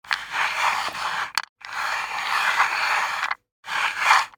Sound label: DICE on Wooden Tray, Slide, Standard, 5 Five Dice; Samples; ArticulatedSounds; DICE Sfx Party (Extended)